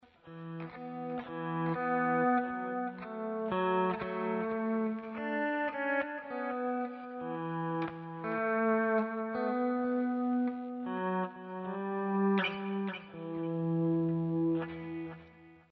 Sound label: auto swell